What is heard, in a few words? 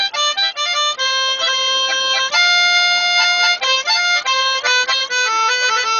Harmonica